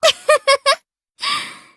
giggle; furfur

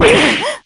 damage; virgilia

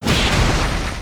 stomp; terumi

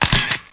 photo snap

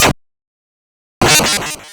death; deltarune

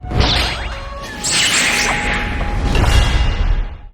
desk slash

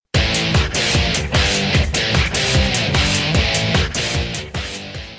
guitar; dante

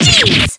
damage; p4u; yukari

